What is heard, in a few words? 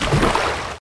SPLOOSH; terrain